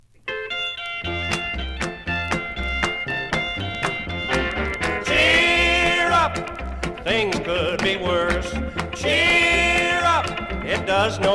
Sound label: Cheer up